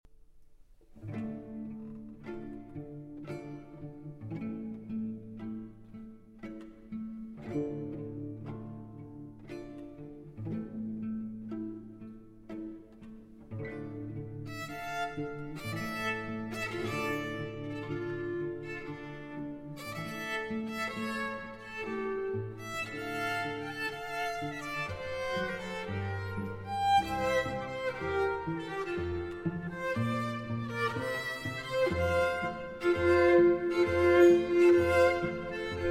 Viola D'amore Concerto in D Minor - For Viola D'amore and Cello